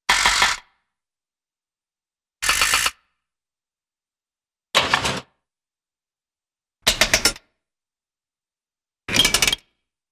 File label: Metallic Machine Movement Buzzy Ratchet-like Sounds, cartoon; Hollywood Edge; Cartoon Trax